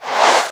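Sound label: Reverb Clap, Boss DR660; drum machine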